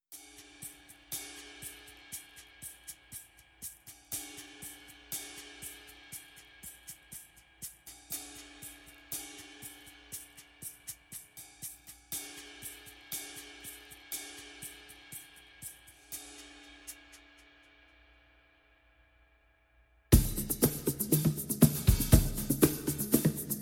perc only

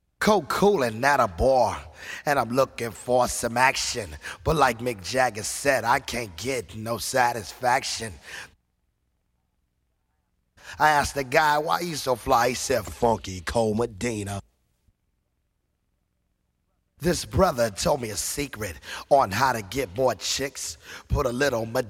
accapella

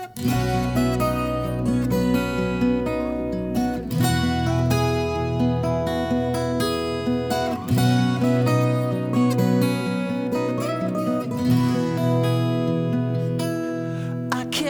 acoustic version

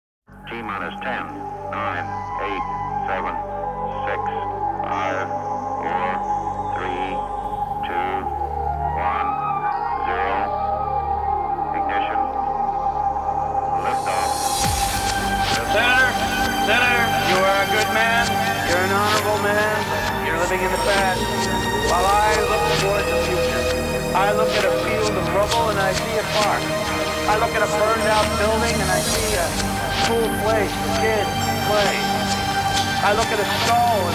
trance intro

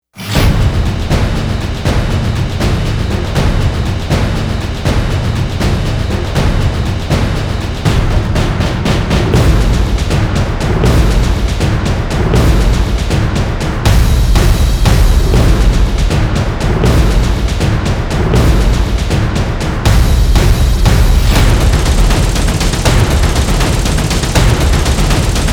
drums

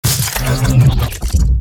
hit complex short

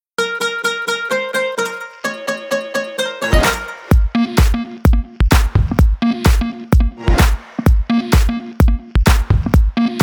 ambience remix

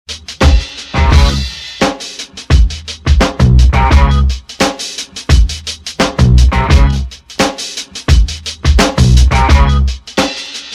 funky interlude

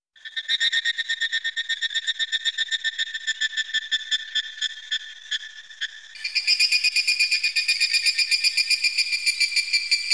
rhythmic cicadas

